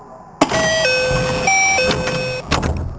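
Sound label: 223 door open; ２２３系１０００番台ドア開閉音; 録音：管理人; BVE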